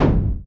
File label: Footstep; Blastmorph Pinball; xbox series xs